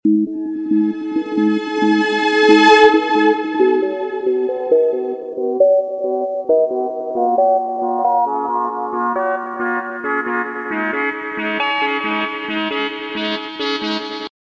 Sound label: cool effect ringtone